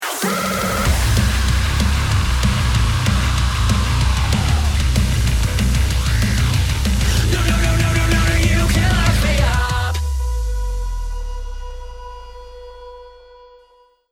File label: bomb ten sec count